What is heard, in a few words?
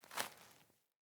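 Boot Walk Vegetation, Footsteps; Characters; Enemy; Boots; Sinecure; Melee Grunt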